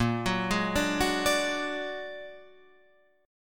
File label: A sharp Augmented 7th A sharp, guitar